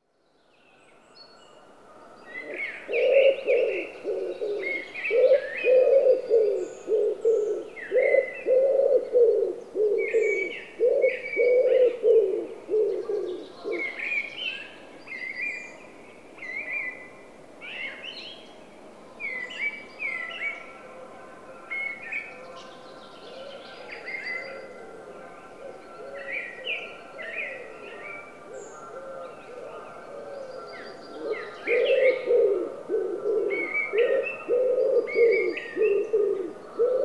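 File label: wood pigeon columba palumbus; vyahir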